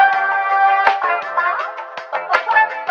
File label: horns, Loops, Phonky